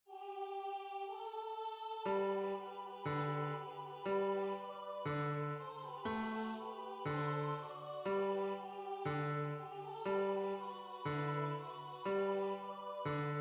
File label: bass